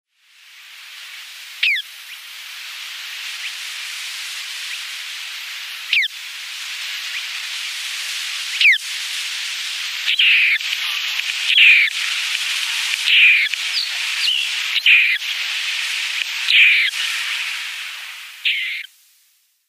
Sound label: Spotted Crake downy Young